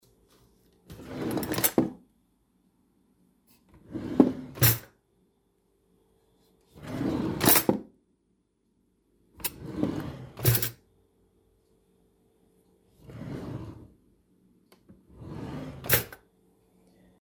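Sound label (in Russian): ящик для приборов без взятия вилок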